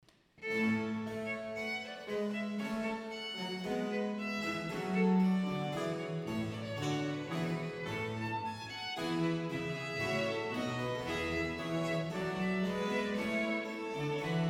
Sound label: Sonata in Bb Major Allegro; Corelli; Op 1 5